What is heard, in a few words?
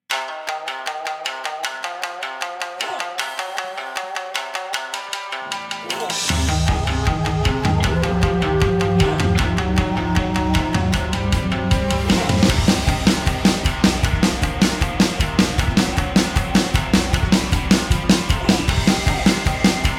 shamisen samurai rock